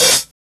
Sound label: HH, Dance Mix